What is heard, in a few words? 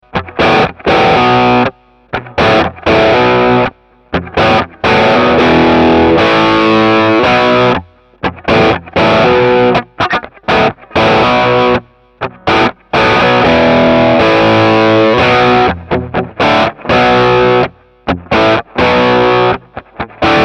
plexi pure